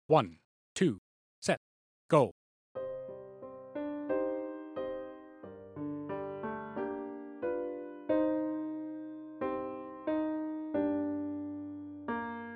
Meadowland 90bpm; tmea solos

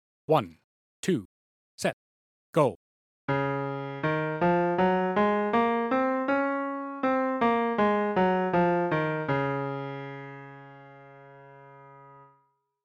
Ab 1 octave 80bpm, one octave scales

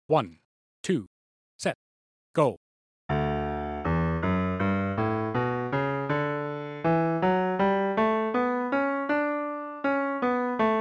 A 2 octave low 80bpm

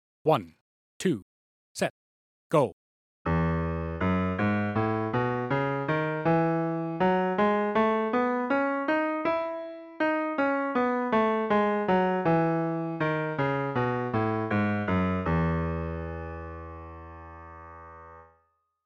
B 2 octave low 80bpm, two octave scales

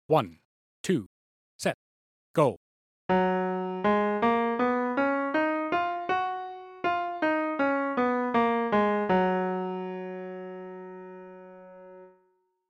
Db 1 octave 80bpm, one octave scales

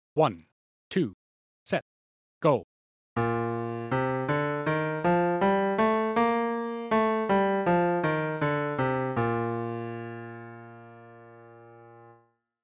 F 1 octave low 80bpm, one octave scales

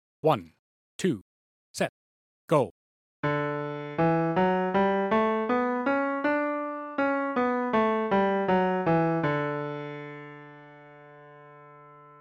A 1 octave 80bpm, one octave scales

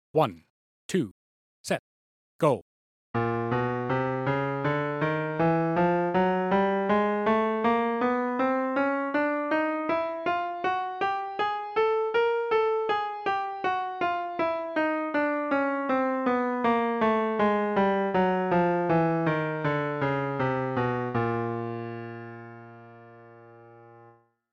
Chromatic F 2 octave, two octave scales

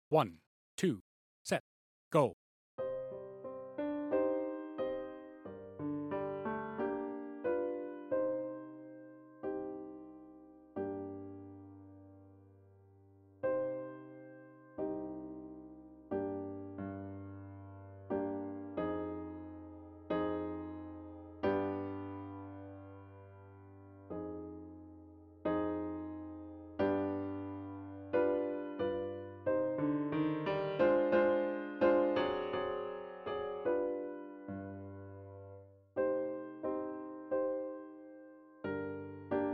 90bpm piano part only; Concert Version; tmea solos

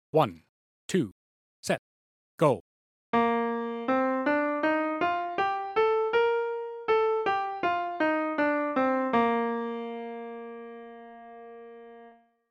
F 1 octave high 80bpm, one octave scales

